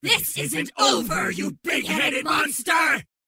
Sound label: MobVoice Mad Mage Die